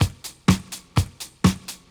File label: drm, drum loops, smooth track; house; PS-28 Vocal House Samples